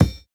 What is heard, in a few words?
Kick, jungle breaks drum sounds